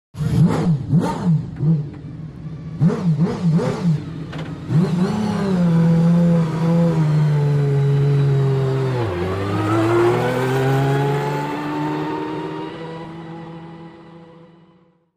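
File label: Sports Car Start Away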